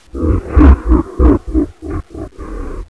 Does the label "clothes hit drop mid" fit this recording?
No